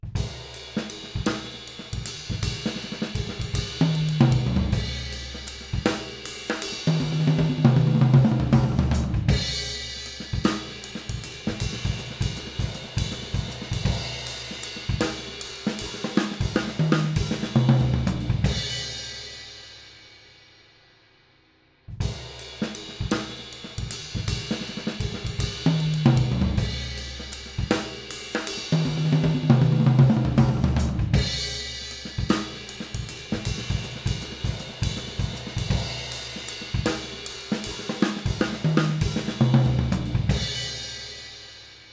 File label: Drums; MJE-K47H